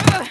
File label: fall; action-quake2; female